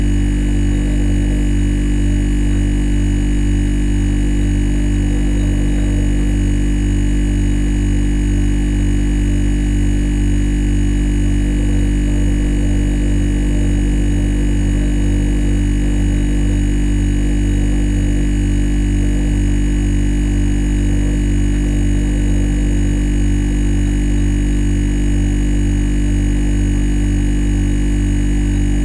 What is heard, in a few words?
fridge hum; cstrike